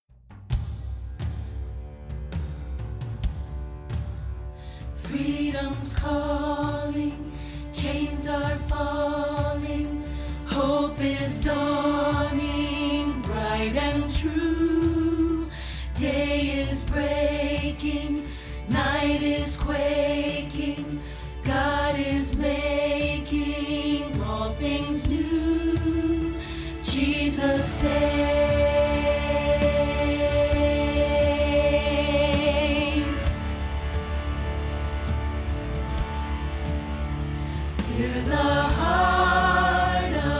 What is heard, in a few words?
jesus saves soprano rehearsal; choir